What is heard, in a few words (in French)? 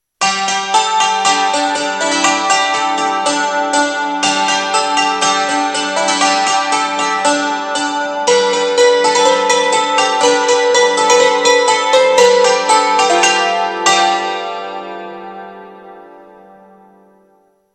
cithare a cordes frappees; instruments